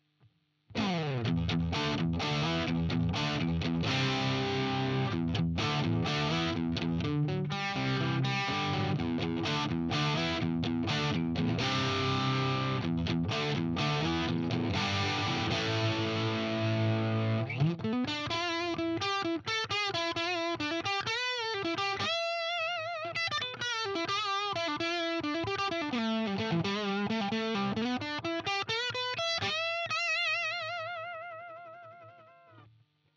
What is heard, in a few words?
HB Fusion-T dist bridge split